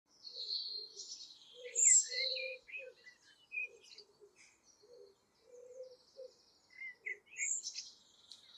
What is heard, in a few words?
Turdus merula female, صدای توکای ماده برای جلب جفت نر